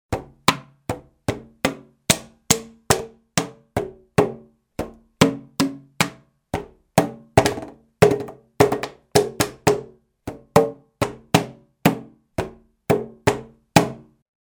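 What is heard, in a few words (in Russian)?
звук бутылок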